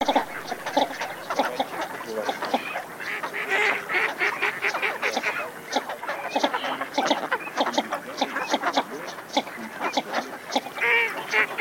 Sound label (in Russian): Широконоска Mestolone; plurifon